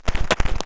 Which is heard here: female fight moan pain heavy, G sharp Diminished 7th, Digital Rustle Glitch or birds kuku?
Digital Rustle Glitch